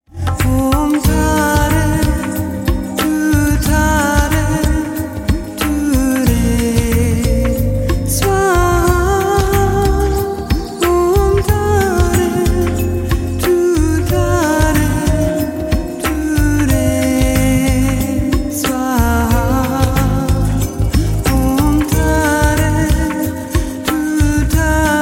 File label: soft tone